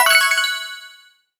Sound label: 10 Coin; SMM2; SM3DW